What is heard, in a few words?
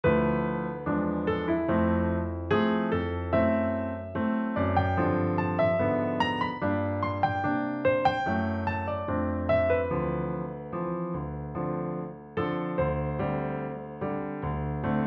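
ballade guitar solo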